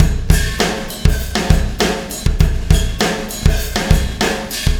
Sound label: Wormhole Beat, Processed Drumkits